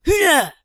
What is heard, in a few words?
attack, Zombies; zps; Vanessa